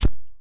Lighter Success; Immersive Ignitables

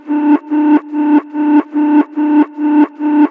Warning Beep Old; Barotrauma; Barotraumatic

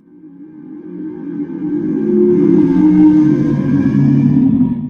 husker idle; Barotraumatic

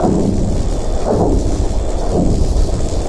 magnetron loop, Weapons; EK modern; Barotrauma

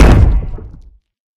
Footstep Exosuit; Armor; DynamicEuropa

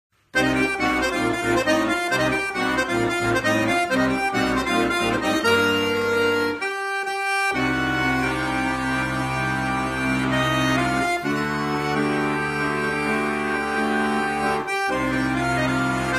accordion; Barotrauma; Epic accordion

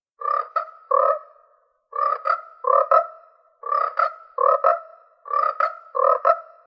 Frogger idle; Barotraumatic; Psilotoadadult

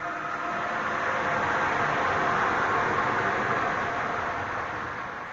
die; Barotrauma; Barotraumatic; Voidstalker

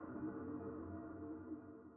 sonar Tail Air Far Shuttle Directional; Barotrauma; Real Sonar

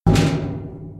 Bang; Barotraumatic

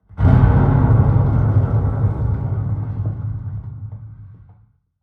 Structure Blunt; Barotrauma; Room FX

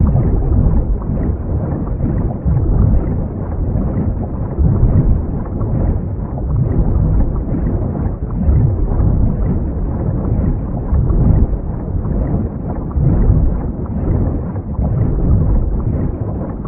Hydrophone Movement Large; Soundproof Walls 2.0

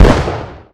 heavy ammo, weapons; EK modern; Barotrauma

